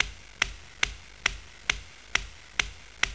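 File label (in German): Max Schrei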